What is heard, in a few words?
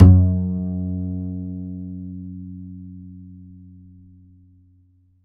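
double bass F#2, Jazzistic; Univers Sons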